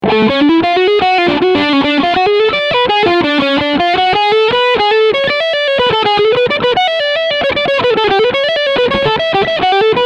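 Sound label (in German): Music Man Sabre Lead Sound Steg Humbucker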